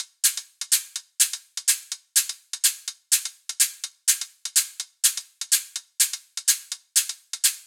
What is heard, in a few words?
125 Drum Loop Hat